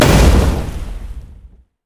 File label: barrell roll boom; LoL; gragas